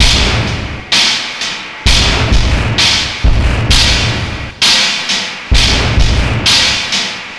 Octave Down Loop 130BPM, DrumLoop Ghost Layers

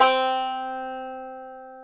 banjo C3; InVision Interactive; Lightware VOL-1; Instruments & Percussions; BANJO ONE

